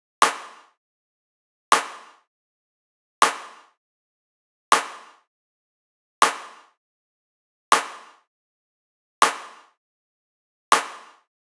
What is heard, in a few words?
Hip Hop 80 Snares; Drums; Drum Construction Kits; Loops; Ghosthack; Drum Mega Bundle; Drum Hero 5; Kit Hip Hop 1